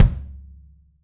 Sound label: WOOD KICK, 3D Drums